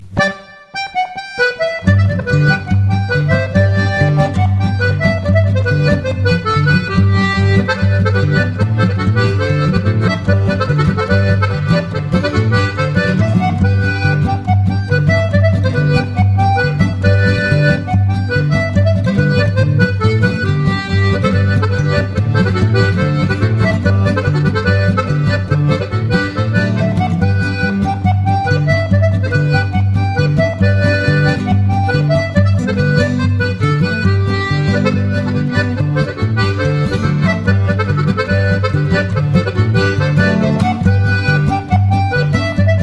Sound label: polka